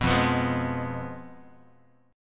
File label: F Diminished Guitar; Fdim strummed; JGuitar